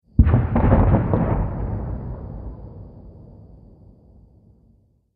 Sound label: War Sounds Explosions, Bomb Sound Effects; War Sounds - Explosions 02